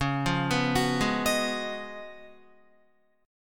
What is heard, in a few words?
Db 11th Db, Guitar; arpeggiated; JGuitar